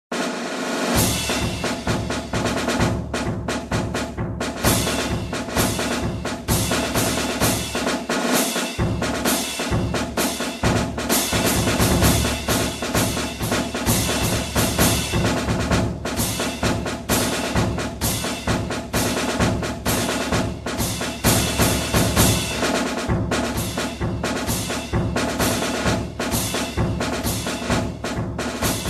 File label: Battle Match